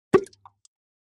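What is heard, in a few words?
Splash Small Plop Plastic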